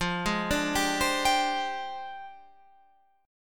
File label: F Minor 6th Add 9th F down Guitar Open F; arpeggiated